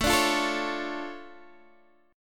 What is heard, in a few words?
D Minor Major 7th, A, guitar